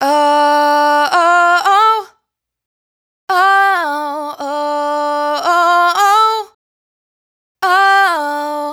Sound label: riff Uh Woah Woah 110 Dm, Dry Vocals; Raw Vocals; Slate Digital Omnivox Vocal Sample Pack